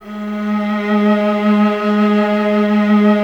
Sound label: Violas G#3, String Ensembles; Miroslav Vitous; LINKS ORCH